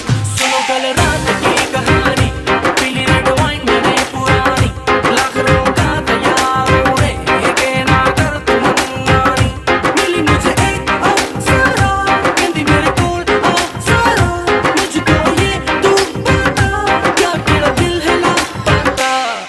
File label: Bollywood Song Ringtone